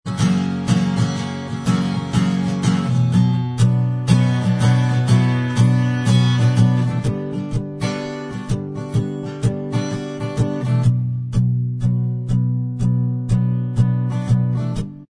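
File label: acoustic, instrumental, backing track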